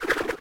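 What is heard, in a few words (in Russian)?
виртуозное владение мечом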